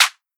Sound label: clap knock, Hip-Hop; Drum One Shots; SD CAICOS Hip-Hop Sample Pack; Slate